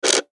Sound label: Button Switch; Multimedia Web Interactive